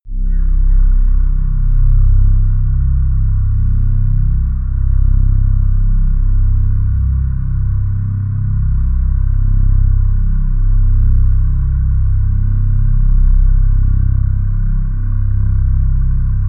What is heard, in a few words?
drone hollow drone